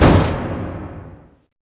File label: Impact